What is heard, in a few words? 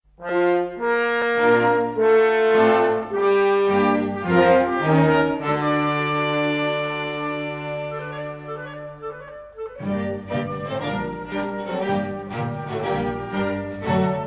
A las barricadas (Instrumental)